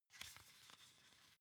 magazine flip page

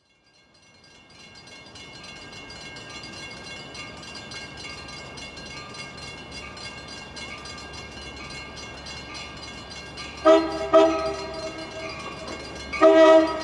train with bell horn in usa

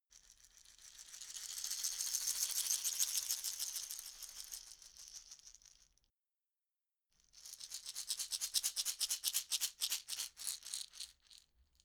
shaker wood rattle dynamic movement

